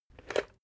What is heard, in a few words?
water boiler pick up hard